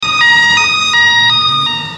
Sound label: horn